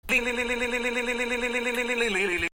Leilelelelelelele

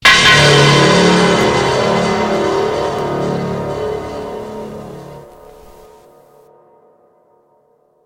Anime Dramatic Sting

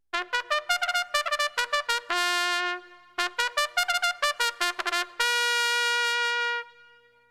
Horse Race Trumpet Fanfare

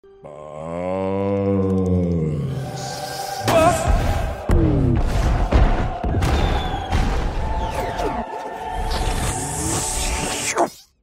Booooos

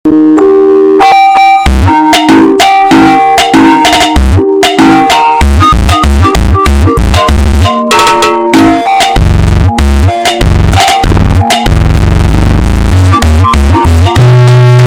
Sleep Phonk

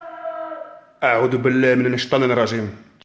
Audubilah Min Ishtan Rajim Muslim Chant

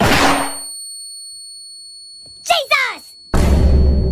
Vine Boom; Jesus

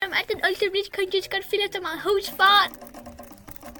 Concert

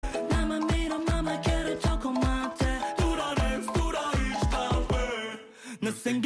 Anime Music

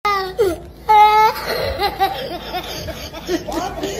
African Boy Cry-Laughing

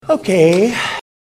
Okay Sigh; Trump

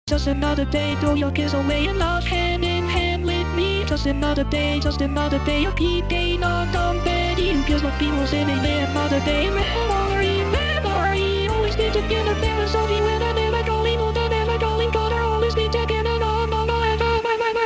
Laugh

